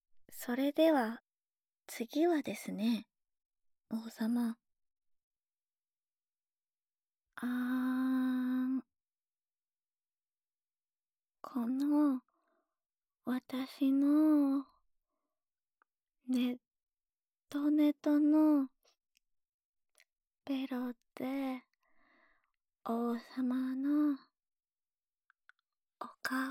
ベロで顔面マッサージ

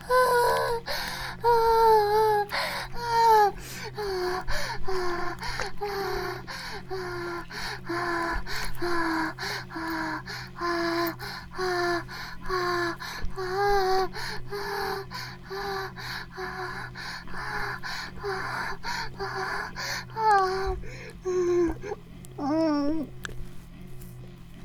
バイブ放置; イクたびにわけて

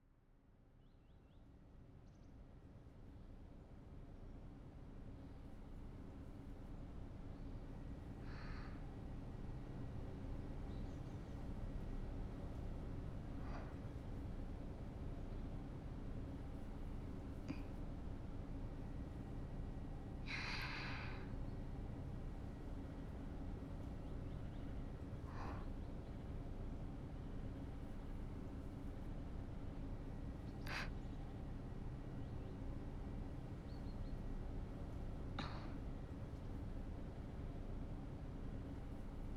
ループ 静かな室内 ときどき列車通過; オクターブガールズ～チップチューン箱守八千代～; 作詞に無限に悩む八千代

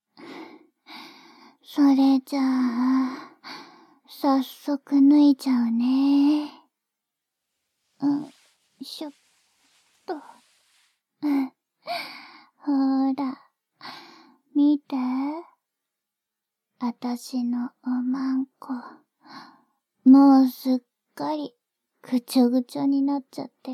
耳舐め生セックス, ASMR

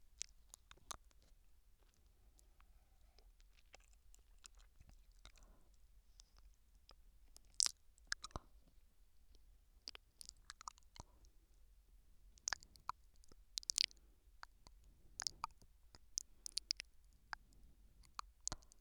左耳甘噛み

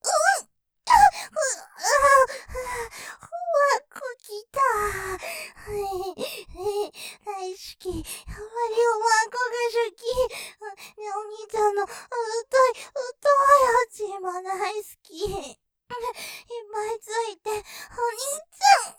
喘ぎ; おまんこぉ、きたぁ！おまんこしゅきぃ; ループで楽しむ！シェフの気まぐれ抜き抜きコース

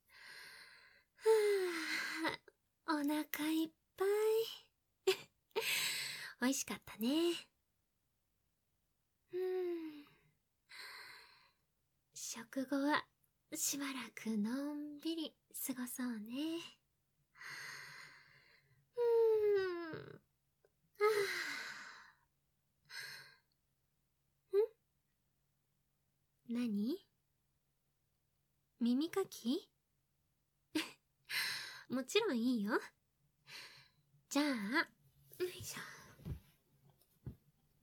食後の耳かきタイム, ASMR

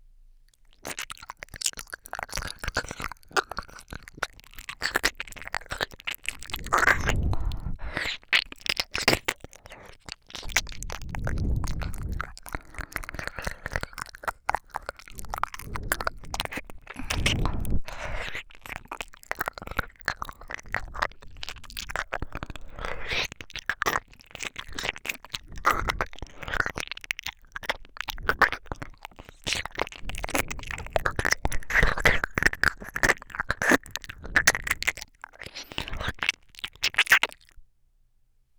ちろちろ耳舐め

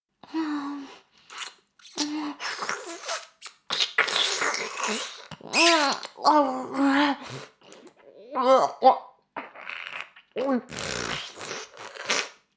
フェラ音声; 牧場戦腺; 少尉

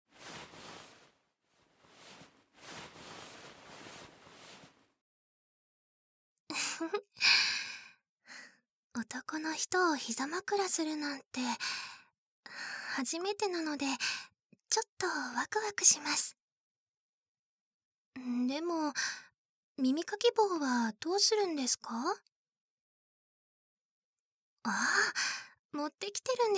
ひざまくらで耳かき♪, ASMR

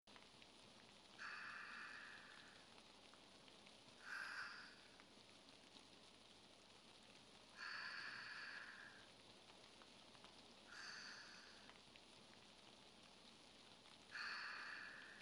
寝息トラック SE

